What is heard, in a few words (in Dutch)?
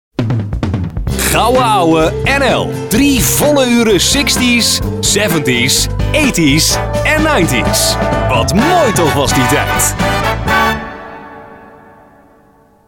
Gouwe Ouwe swingend